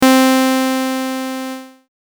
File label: decay